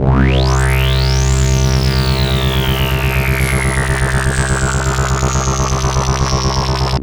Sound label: Roland C C2, Roland S100; musicradar-synth-samples